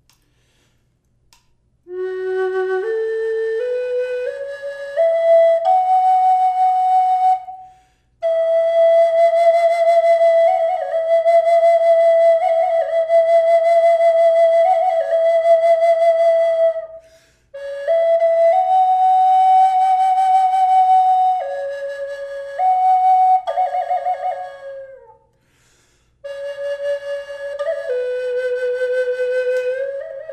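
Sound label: reverb, flute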